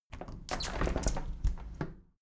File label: window handle plastic squeak open tilt regular; objekte; fenster